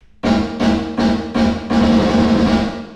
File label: LATE REVERB Clear Plate